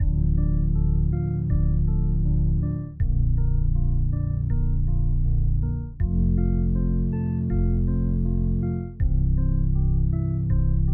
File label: cinematic orbit 80bpm loop